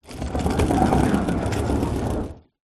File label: foley suitcase pull wheels wood floor short